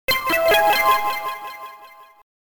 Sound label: Event Jingle; Metroid Prime Hunters